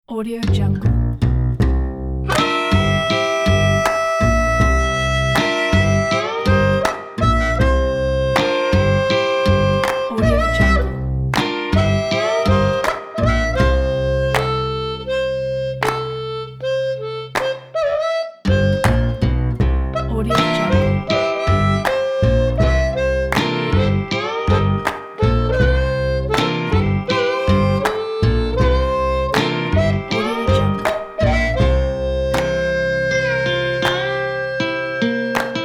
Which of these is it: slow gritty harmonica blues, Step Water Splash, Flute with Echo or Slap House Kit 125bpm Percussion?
slow gritty harmonica blues